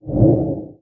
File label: Shadow Cast, skill; Project-AI-Chronicle